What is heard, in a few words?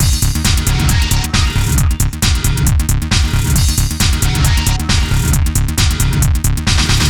groovebox